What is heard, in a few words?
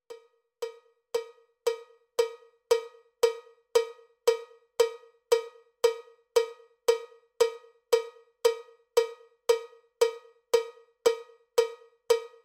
cowbell click track bpm 79; cowbell click track bpm 115